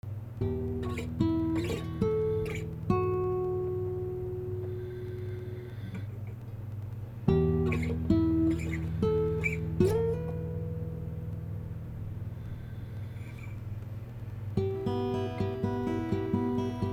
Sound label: guitar cover; The last of us